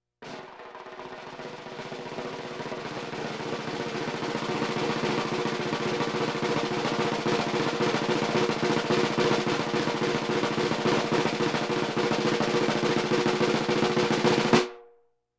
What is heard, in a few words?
Percussion Bongos Tympani rolls Gongs Drums